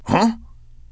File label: Chat